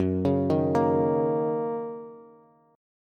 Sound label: Gb 6th Gb Bass; Gb6 arpeggiated; JGuitar